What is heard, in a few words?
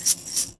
Foley